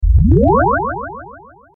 strange wave

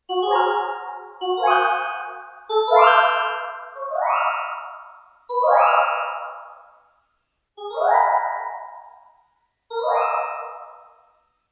fairies

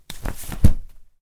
umbrella open

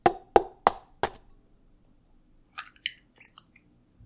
cracking egg; cooking

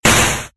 game explosion; arms

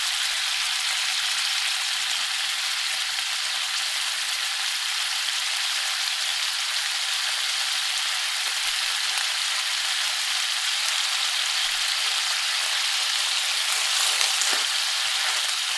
roasting sausage; cooking

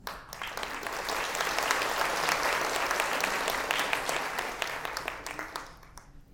clapping

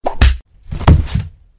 knocking out; attack

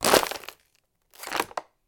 trample PET

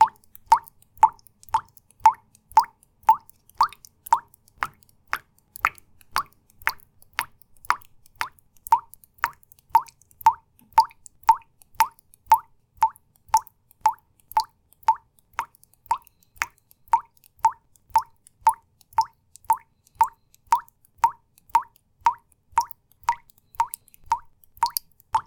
drip down, 落ちるの早い水滴; 落ちるの早い水滴１; wetarea

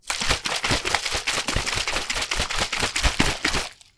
shake PET

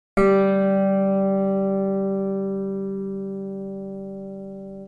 guitar

